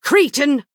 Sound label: attack; uupse fyr